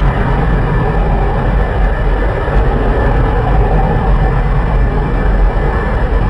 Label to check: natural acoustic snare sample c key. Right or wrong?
Wrong